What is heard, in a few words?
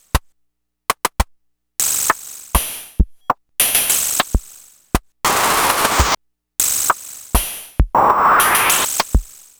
Money Groove, Beats; Sonic Foundry (Sony Creative Software); Ma Ja Le Ethereal Textures